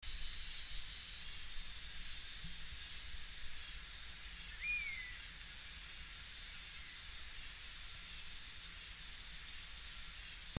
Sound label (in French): aigle tyran, chant